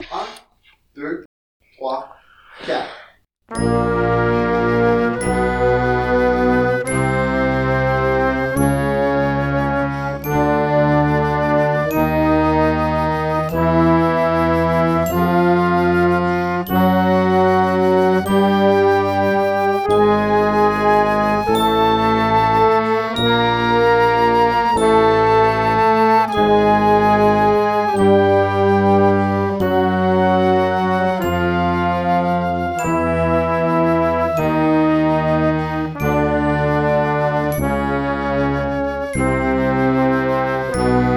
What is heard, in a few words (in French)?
sib chromatique noire a 80